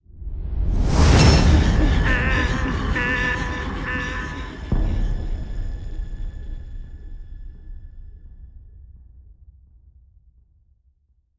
Fanfare - Man Down (Player Eliminated)

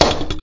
Shake Metal Pop; Talking Tom Cat 2; android ios

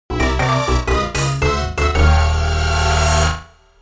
Final Lap (Hit By Lightning); Mario Kart Wii

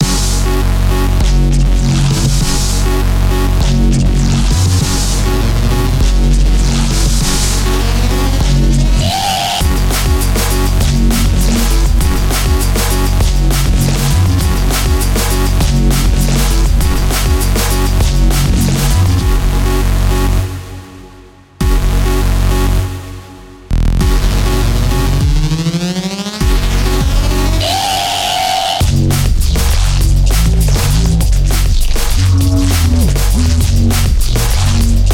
Instrumental

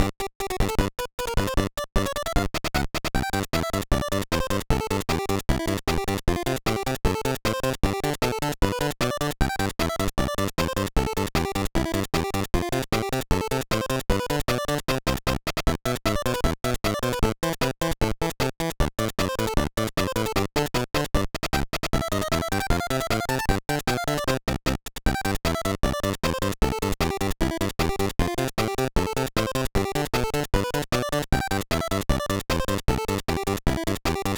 BGM

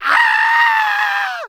SCREAM Male mono

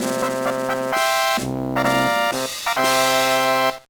Jingle, gba; Dan Doh Xi

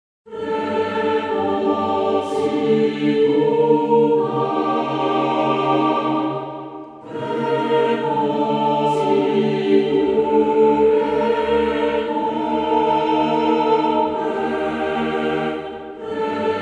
Sfx Chant; Fixed Point; Girls' Frontline; Android

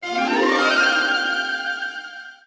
Fanfare!; PokePark Pikachu's Adventure; Wii

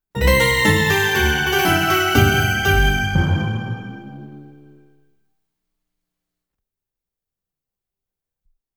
Defeat Jingle; tokimeki memorial taisen tokkaedama poitter s point

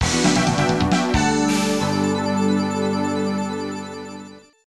Area Clear; Armored Warriors; arcade

